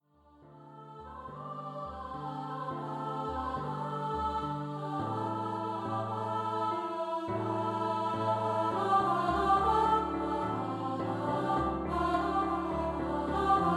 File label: 2 Part song for Ladies Choir